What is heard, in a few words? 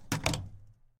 refueling nozzle place